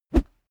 whoosh bamboo high

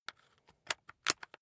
rock drill head attach heavy; maschinen; bohrer; soundpool

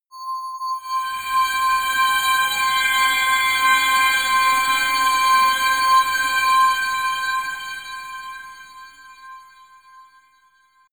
scrapes ringing buzz blitter, drones; musikelemente

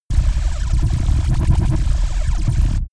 space laser blaster massive damped; krieg-waffen; soundpool

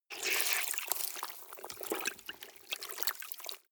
clothes water wring hard

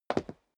step sneaker wood parquet single stair down; schritte

